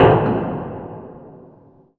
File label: big clank